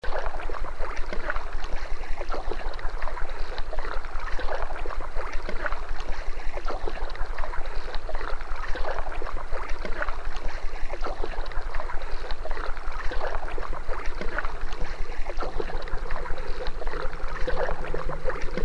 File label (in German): paddelgeraeusch komposition